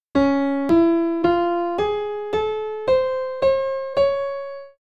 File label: Magic scale seven; ragajunglism